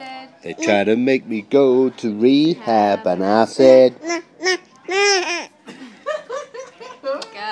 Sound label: singing